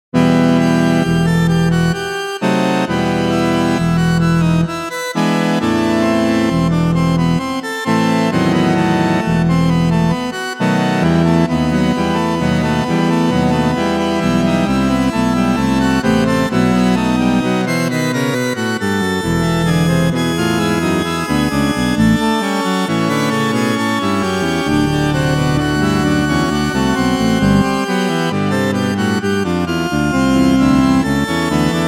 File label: Pipe Organ